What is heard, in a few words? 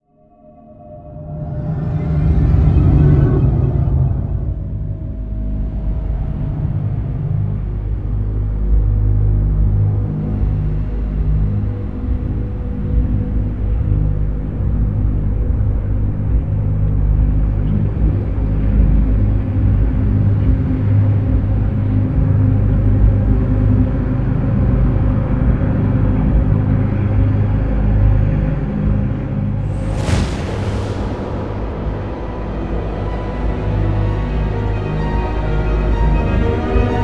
ambience monster; spawngirlsounds